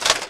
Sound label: foley, footsteps; physics; axe